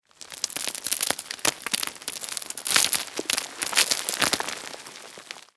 building creak

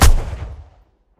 m1919 last shot left, guns

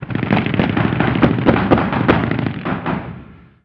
gun usassault med

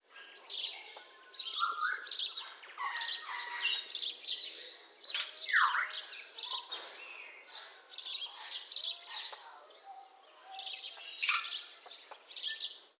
ambient; World of Tanks

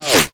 bullet whizby; whizbys; ModernWarfare

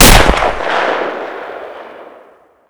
Inf Weapons; Red Orchestra 41-45

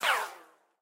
bullet ricochet; War Thunder

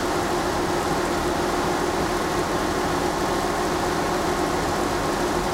comet engine rpm 33, tanks; engines; War Thunder

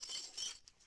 Rifle Clean Long; Civ4 warlords

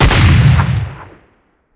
bk 75mm shot, guns; War Thunder

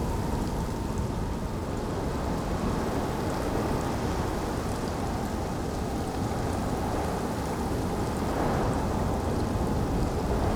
ambience beach day windy drizzle; Rust

